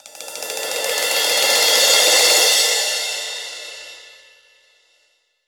Crash Phrase, Roland BK-5 Cymbals

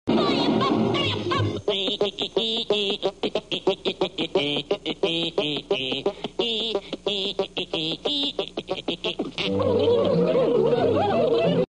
Rubber Band; Looney Tunes

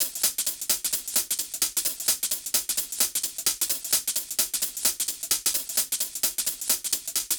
Hihat Loop 130; Equipped Music; Loungin House; Hh-Shake Loops 130

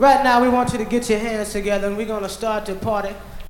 VOX GENERAL; Megabass Remix! 2

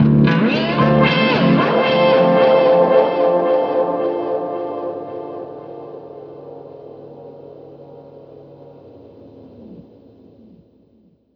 heal tones, guitars; Big Fish Audio - Alien Guitars; LOST HEALING

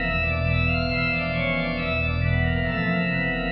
Video Organ 136-E, horrorscape samples 136bpm

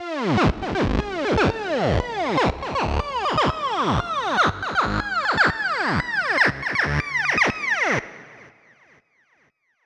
Uplifter F; 99Sounds x Monosounds

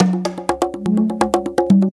Conga, Percussion Loops

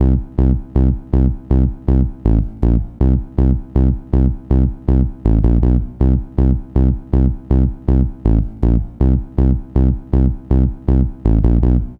gro 160, Hardcore Loops, Bass Loops; Dance Synths

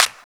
Clap; Equipped Music; Loungin House

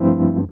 E.PIANO, KEYS

